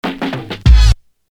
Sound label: Scratch; Sonic Foundry (Sony Creative Software); Hip-Hop Street Beats Construction Kit; MAC MONEY